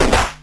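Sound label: Battle Hi Damage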